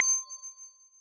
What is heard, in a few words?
Extra score out